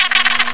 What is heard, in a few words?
gurp; quake2; hal